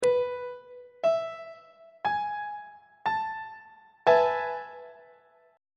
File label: a major 7th suspended 2nd 1st inversion on treble clef